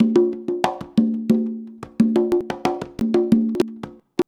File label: CONGA 119, Modern Percussion Loops; USB Soundscan vol.56 - Modern Percussion Loops; AKAI